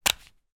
household nintendo ds game cartridge remove from case